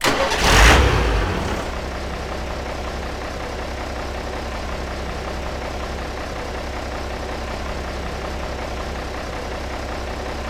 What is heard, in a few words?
start loop, forklift; garrysmod; sw forklift truck; sligwolf